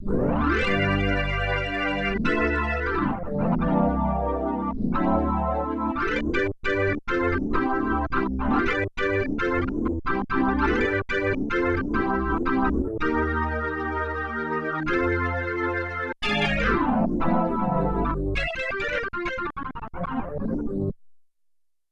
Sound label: Dirty Hammond; Yamaha TX16W; Big Band Performance